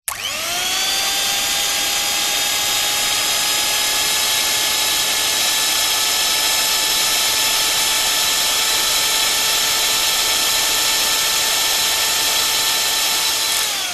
household food mixer hand held electric on off long burst